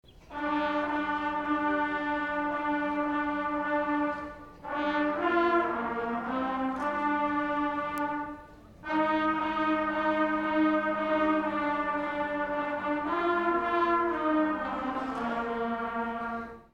Trumpets

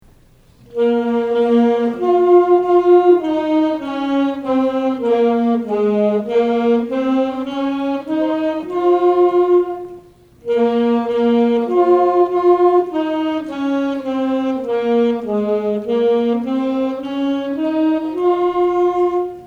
Saxophones

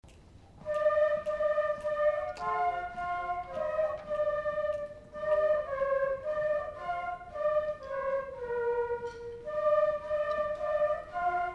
Flutes

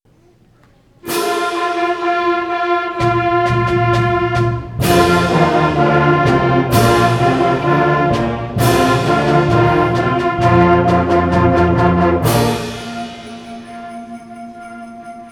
Christmas Fanfare

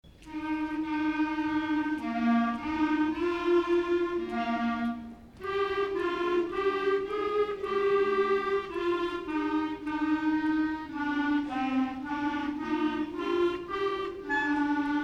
Clarinets

